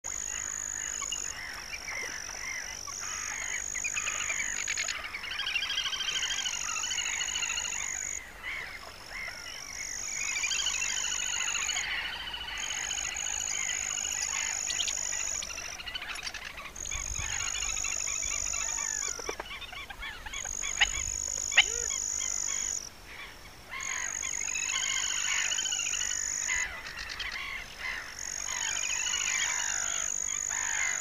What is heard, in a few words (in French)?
grebe castagneux, tachybaptus ruficollis